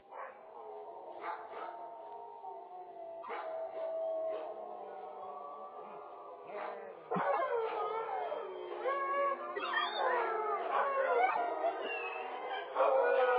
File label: Siberian Howling